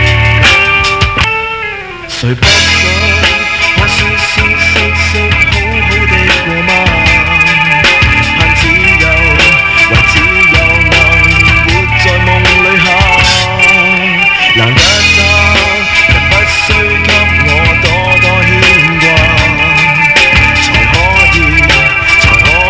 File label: indie